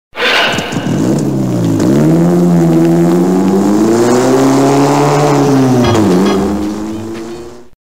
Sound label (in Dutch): Motor starten kermis; Kermis Algemeen Deel 3